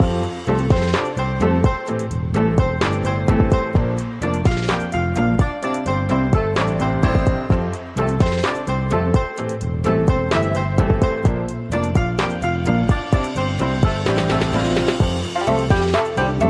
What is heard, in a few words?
music tiger